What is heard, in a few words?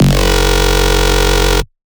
Bass Shot F#, dubstep; One-Shots; Papercut; Ghosthack Advent Calendar 2021 - Day 16 - Dubstep Basses